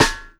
Rim Rim Click; Forzee

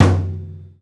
Tom Mid; Millo V1

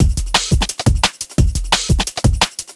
Full Drumloop 174bpm, liquid; DNBACADEMY

